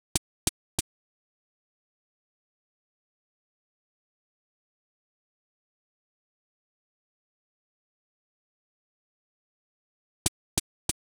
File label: Space Drum Loop - 95 BPM Percussion; Loop Stems; Cymatics